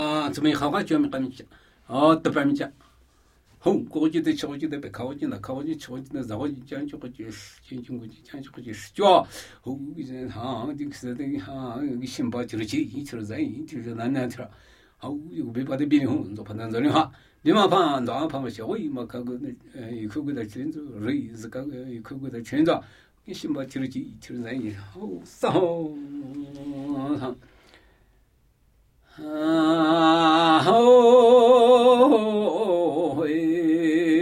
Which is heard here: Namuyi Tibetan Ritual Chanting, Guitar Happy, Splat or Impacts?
Namuyi Tibetan Ritual Chanting